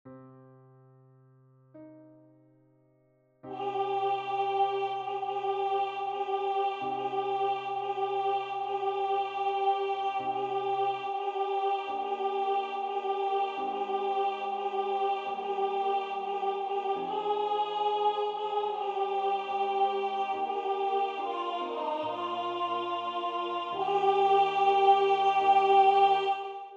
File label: soprano; misere gounod